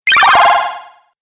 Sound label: Classic Delay Game Hit, video game sound effects; Twistedtracks